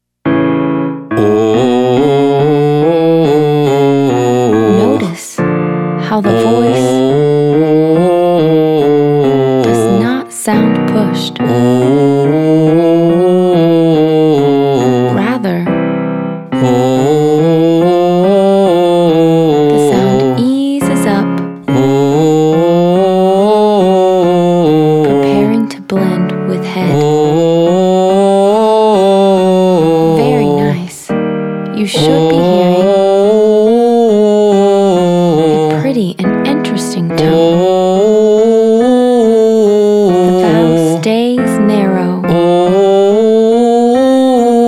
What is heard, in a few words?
Exercise 6 Irish Oh male